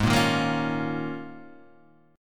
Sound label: D sharp 7th Suspended 2nd Suspended 4th G sharp